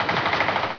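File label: machine gun burst; ambience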